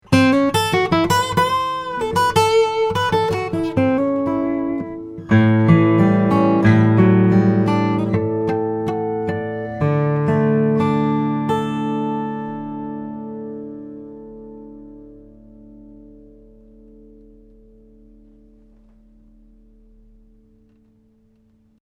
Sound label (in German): U 87 Git Niere